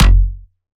Bass Shot F# Pluck, Dubstep; Ghosthack; Advent Calendar 2018

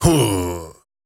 Grunts and Chants, Viking Vocal

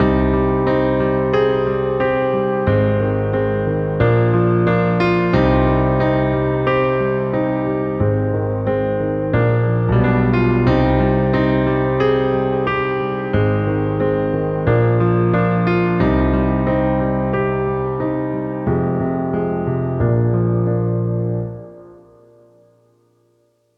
BPM 90 Main Piano Cm; Why; Leads; Ghosthack

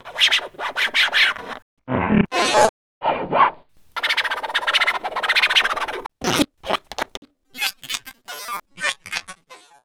squeal mix, Cartoon Film Effects

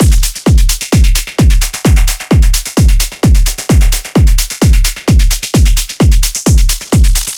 Drum Loop 130, Techno; Ghosthack; Free Techno Sounds 2024

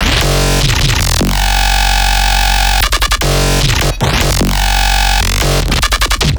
Bass Shot F# 150, Dubstep Basses; Loops; Jigsaw; GhostHack; Advent Calendar 2021

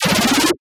Retro FX; Cymatics; Video Game Sample Pack Vol 1

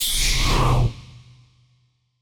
Spaceship FX

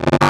Transistort Grid Fragment G 145 BPM, Psytrance; Grid Loops

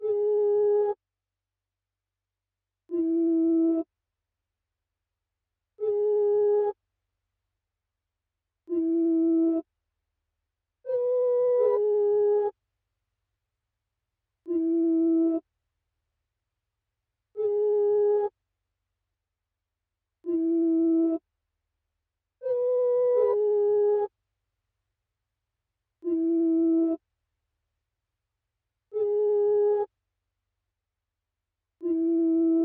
166 BPM C# Min Accent; Loop Stems; Cymatics; Fallin 4 U

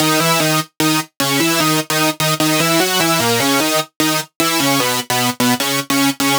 Lead Loop D# Dark, Dubstep, 150bpm; Ghosthack; Dubstep Sound Arsenal

